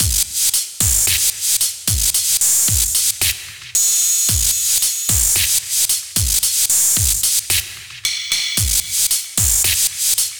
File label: drums